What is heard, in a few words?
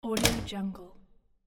door closing; lama-sound